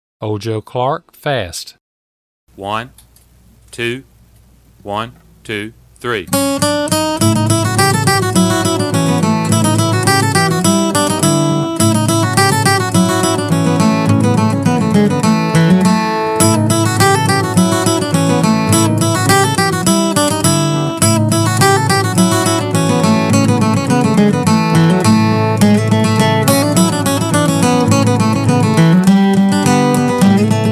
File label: Fast; Old Joe Clark